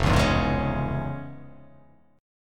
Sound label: F Minor Major 7th Sharp 5th